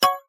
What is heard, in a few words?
shelter chat; Original TK Sounds